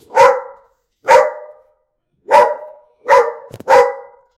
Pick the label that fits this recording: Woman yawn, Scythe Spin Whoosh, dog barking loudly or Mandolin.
dog barking loudly